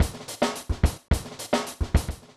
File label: Yupee, Drum Loops, Vintage; Zero-G Smoke